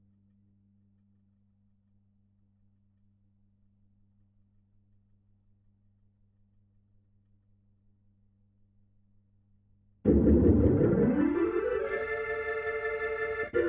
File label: HAMMOND BOT